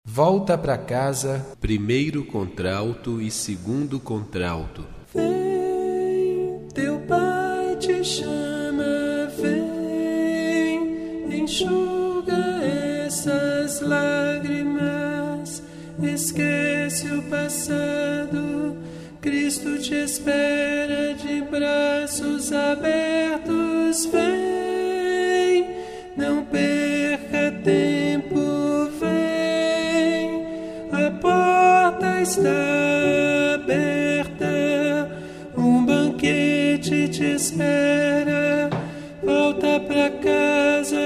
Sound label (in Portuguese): contralto e 2. contralto, coral vozes; 1. contralto e 2. contralto; coral vozes da esperança; kit de vozes